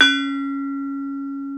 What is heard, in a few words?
BRONZE, Bronze Gamelan; E-MU Formula 4000 Series Vol. 4; Earth Tones